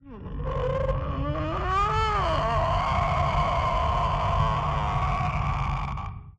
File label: groan; Poppy Playtime; Mommy